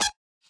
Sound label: wobble